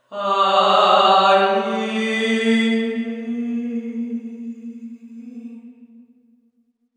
Convolution, San Francesco

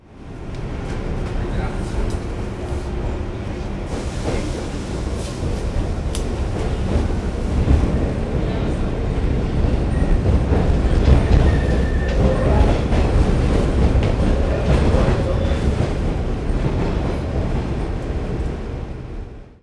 Subway

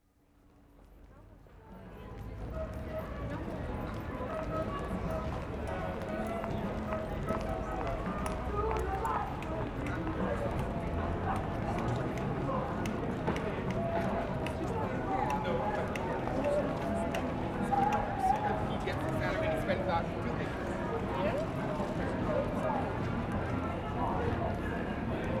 Return to origin point, Carnaby Street ambience; BERWICK STREET MARKET

